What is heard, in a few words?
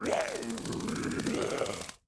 mutroop main, monster